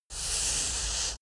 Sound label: paper cloth dragging